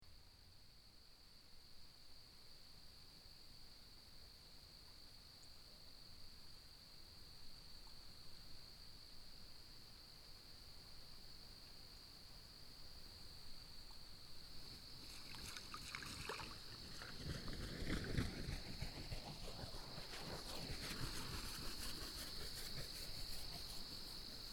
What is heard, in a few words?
forest ambience with Great Blue Heron taking flight